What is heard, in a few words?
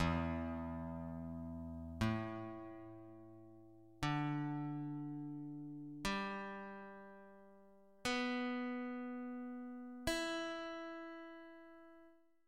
Guitar Standard Tuning